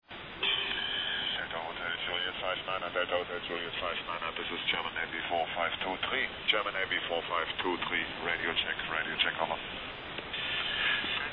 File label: german navy